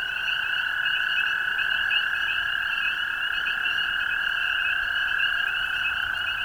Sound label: american toad